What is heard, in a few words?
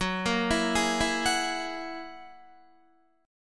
F sharp 9th F sharp Guitar; F#9 Chord; arpeggiated